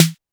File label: SNARE, one shot drums; App Inventor Media